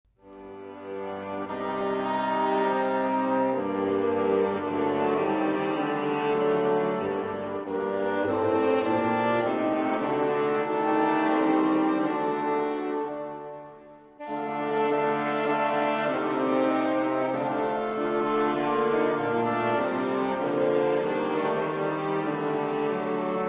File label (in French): Ensemble de dulcianes